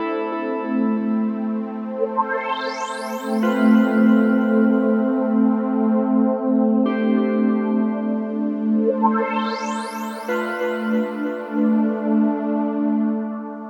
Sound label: Pad; Dance